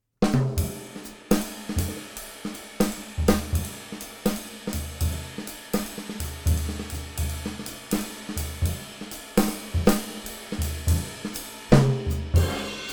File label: drum experiment